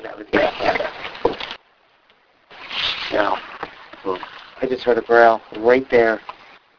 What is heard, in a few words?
growl